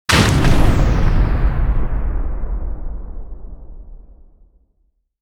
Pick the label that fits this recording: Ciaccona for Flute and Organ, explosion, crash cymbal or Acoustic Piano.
explosion